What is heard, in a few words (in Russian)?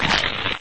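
треск стекла когда на него наступили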